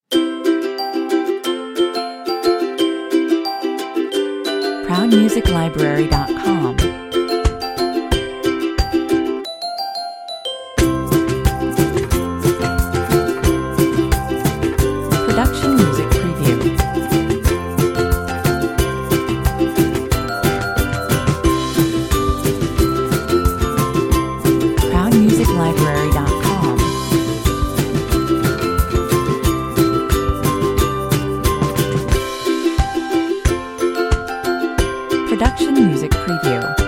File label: sunshine commercial 180 bpm